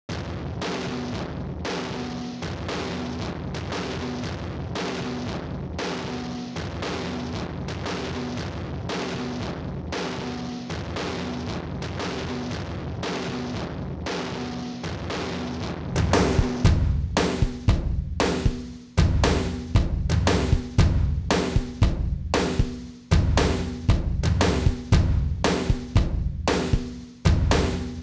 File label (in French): batterie